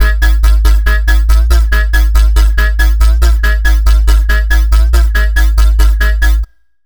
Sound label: Bassline C3 140, Dubstep; GhostHack